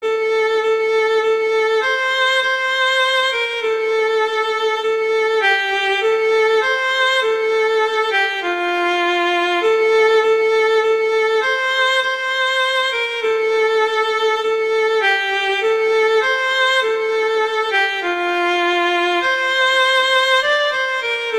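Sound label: Angels We Have Heard on High, Violin; Christmas Beginners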